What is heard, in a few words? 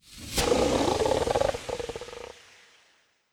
Poison Cloud